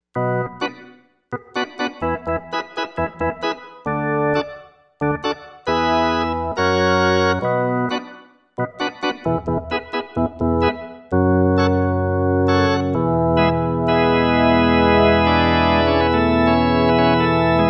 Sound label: Full Drawbars